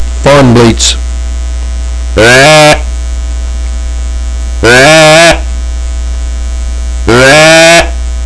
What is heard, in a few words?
southland fawn